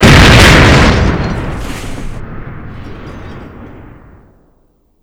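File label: explosion metal armor distant; modern warfare 2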